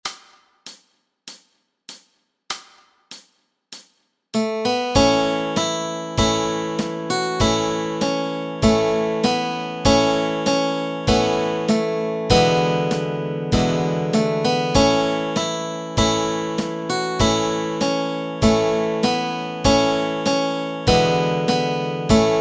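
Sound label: melody and chords, Easy Guitar and Ukulele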